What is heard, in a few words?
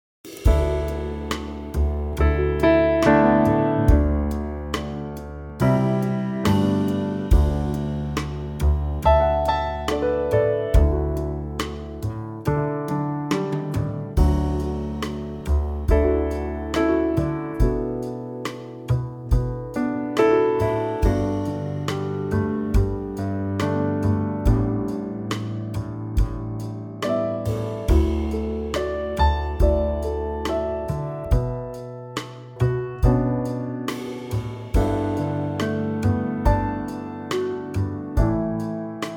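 D Trio, Backing Tracks for Male Vocals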